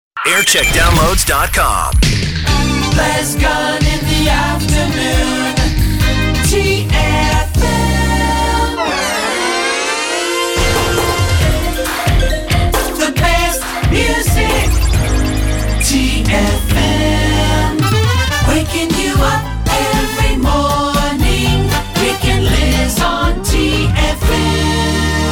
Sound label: Jingle Montage; TFM Teesside; JAM